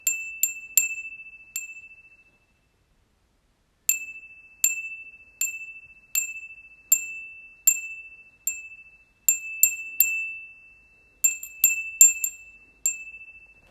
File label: windbell